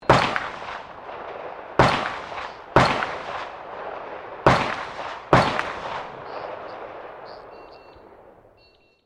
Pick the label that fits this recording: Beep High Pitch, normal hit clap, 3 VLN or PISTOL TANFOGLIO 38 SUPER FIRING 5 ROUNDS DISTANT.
PISTOL TANFOGLIO 38 SUPER FIRING 5 ROUNDS DISTANT